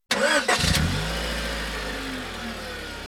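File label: car start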